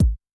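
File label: bass drum Dance, Kick, Yamaha DTXpress-II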